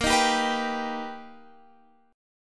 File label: B Augmented Major 7th A sharp, chord